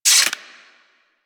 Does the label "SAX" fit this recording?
No